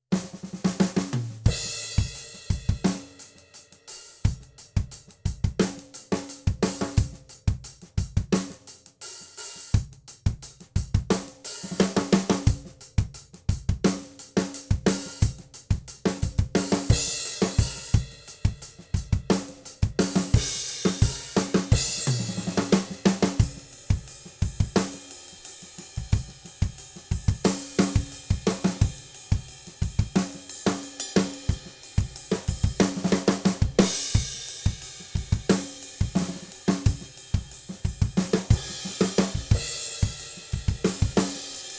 Groove

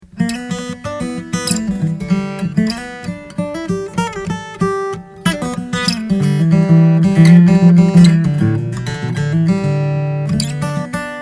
Alvarez MGA66 ESHB bass

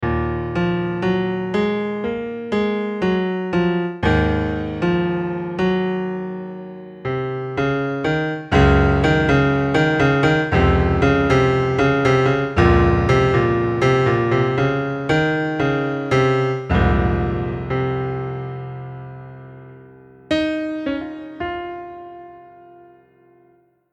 Piano

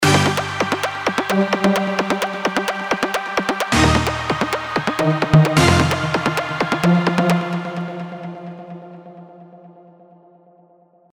EDM Intro Filler; Nexus 3

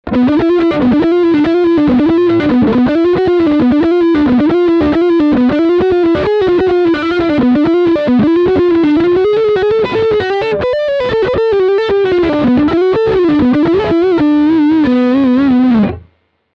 Lick Front pickup

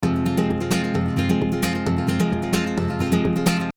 Gipsy dry

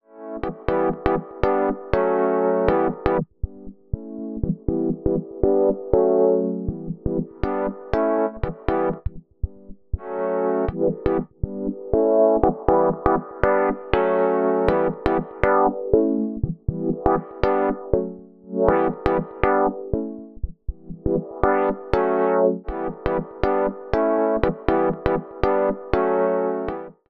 AKAI MPC LIVE II LPF

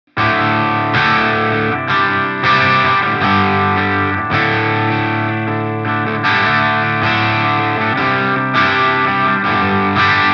Royal Flush Boost max. Gain

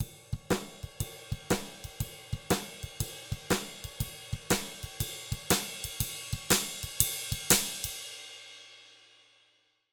Drums HF 8k 0 bis +15 HFPeak; RND Portico 551

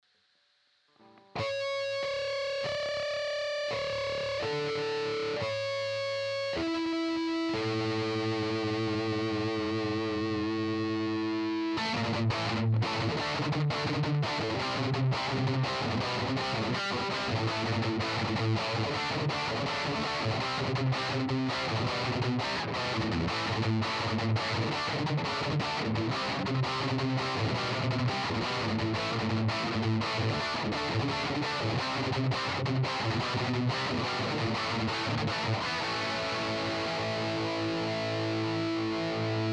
Rhythm Burn scoop DI Out; EHX 5150

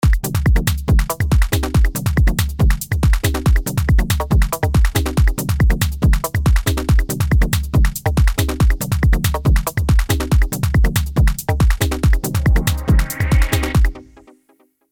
Phat Seq Beats

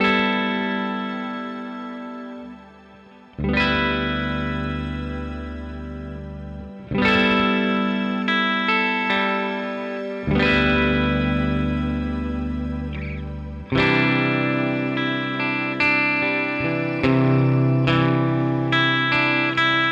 Neural DSP Quad Cortex Effects Shimmer

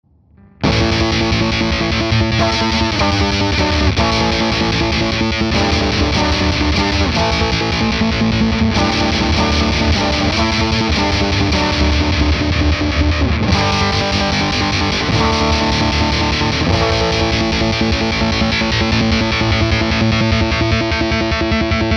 Rise n Shine Magenta Mode Step; Stone Deaf

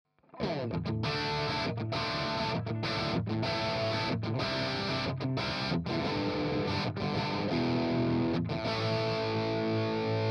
Fryette rhythm

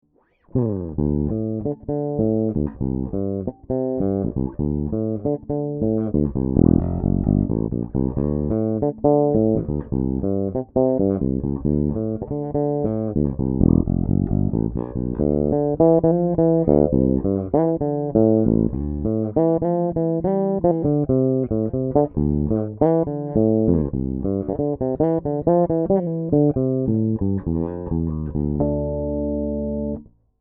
Fingerstyle Bridge PU Tone zero

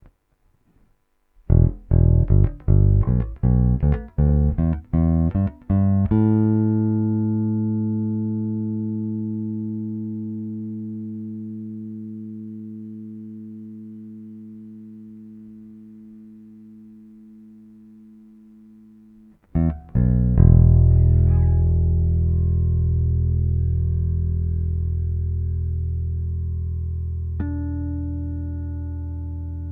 Neck full all four

